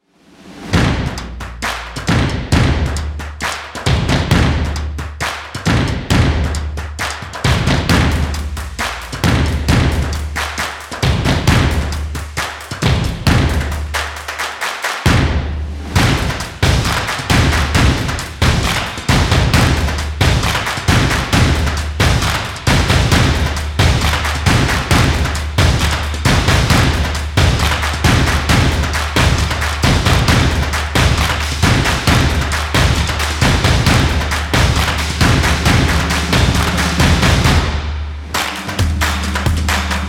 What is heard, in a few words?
Stomp Opener Percussion; energetic